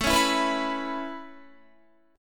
A Major Add 9th A Guitar, chord; Aadd9 strummed

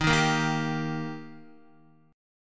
D sharp Major D sharp Guitar, chord; strummed; x,6,3,3,3,3; JGuitar

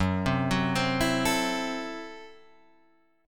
Gb Minor 7th Flat 5th, Guitar Half Step Down

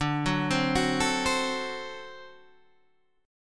D 7th, down, Guitar, Open G

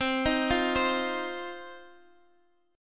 C Minor Double Flat 5th C down Guitar Open D

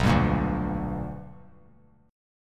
B Minor Major 7th Sharp 5th, chord; strummed; JGuitar

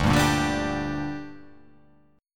D Suspended 4th Sharp 5th D down, chord

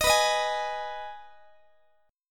B 6th B down Guitar 4A 4E 5A 5D 5G sharp 6C sharp; strummed

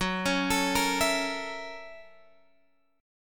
Gb 7th Sharp 9th Gb down Guitar Open G

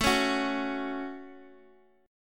A 6th, Guitar, DADGAD; x,x,x,2,4,4

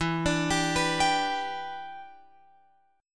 E Minor 7th, down, Guitar, Open F; arpeggiated

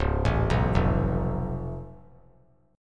Db Augmented 7th Db down; arpeggiated; JGuitar

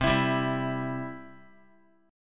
C Major, Guitar; strummed; JGuitar

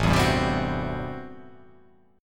A Minor 13th A, Chord; Am13 strummed; x,0,2,0,0,2,2,3; JGuitar

